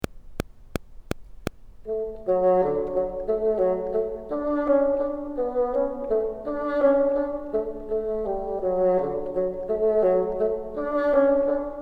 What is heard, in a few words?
bottom, bassoon